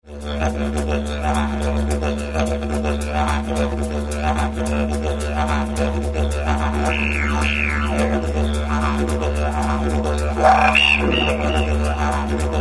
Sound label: Didge Jam